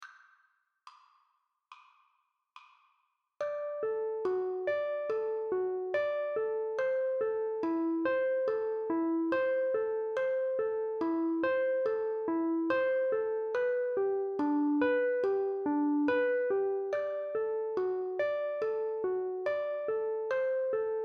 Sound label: Intro Medium 70bpm, uke